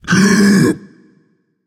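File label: non-verbal breath; smite; atlas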